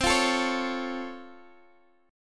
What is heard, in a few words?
Db Augmented Major 7th, C